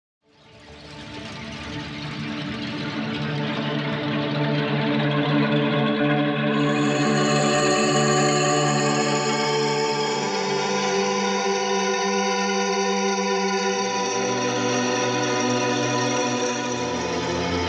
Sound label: Col Legno Purity; soniccouture flowstate; Kontakt